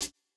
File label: Jrock hihat